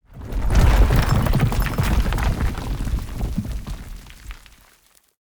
building collapse

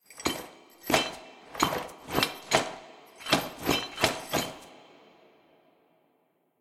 walk walk surprised phase

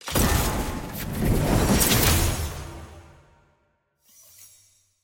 sfx tier wings promotion; ranked; bronze

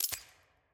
sfx vc party ptt release; premade voice

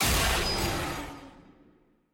skill hit; evelynn skill 1

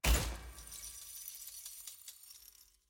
artifact click; jinx

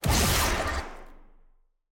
skill hit; viego

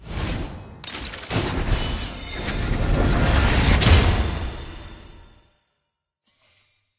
tier crystals promotion; challenges; to bronze

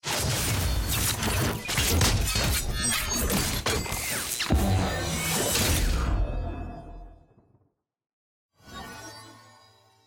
tier promotion diamond to master; leagues; regalia

